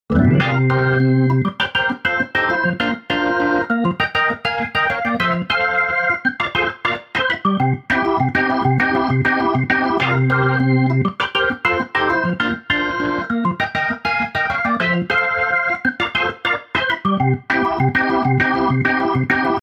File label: soul organ, vintage keyboards; logic pro